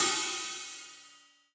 Acousti Hat Hit, hihat; ultimate hihat; Hits

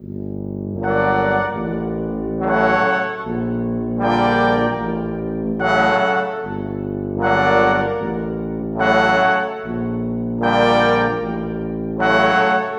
Brass, Cinematic; Sonic Foundry (Sony Creative Software); Orchestral Series VOL-3 - Cinematic

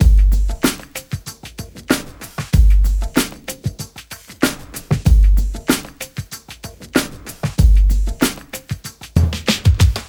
ABORIGINL BREAKB L; Spectrasonics

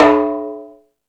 Latin Drums, E-MU Pro-Cussion

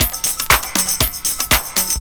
house loop

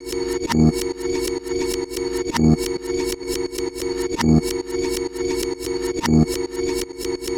Bas Str Loop 130; Equipped Music; Loungin House

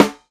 Hammer B, Dimensional Snare; Airwindows

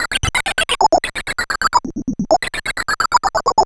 Sh101 Loop, 130BPM; musicradar; bitcrushed; Crushed Loops

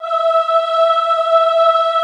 AHH E4, Classical Choir; Best Service; Extended Classical Choir; AHH MIX

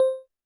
percussion, Roland TD-20

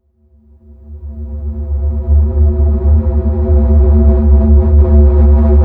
enter, Loops; Polyend; Loops n trouble

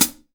K-ZIL, 14INCH K-ZIL HI HAT

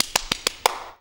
MULTCLAP, MULTICLAPS; Best Service; World Colours

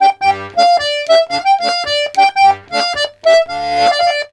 RONDO, Accordions; USB Soundscan vol.40; AKAI

